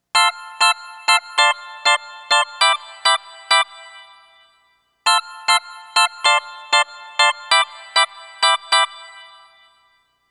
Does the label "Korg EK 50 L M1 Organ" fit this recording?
Yes